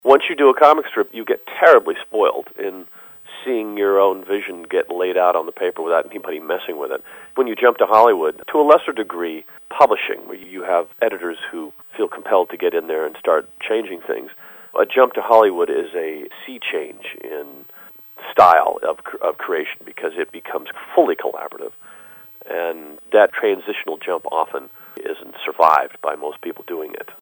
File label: solo